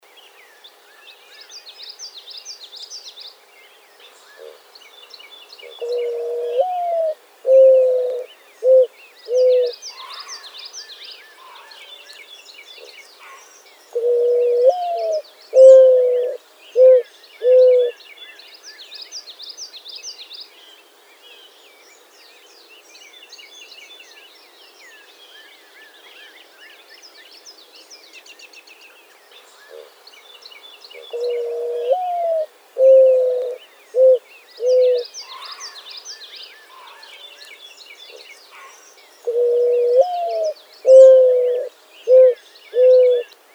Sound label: Mourning Dove, birds